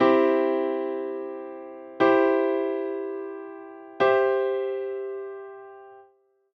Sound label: chords 4 notes inversions; triades